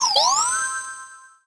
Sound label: Heli